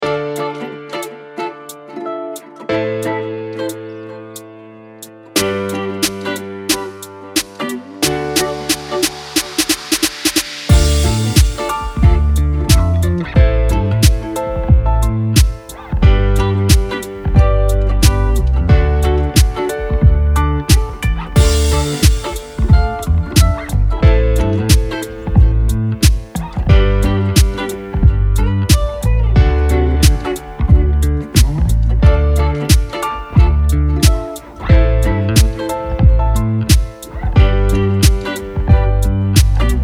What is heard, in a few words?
instru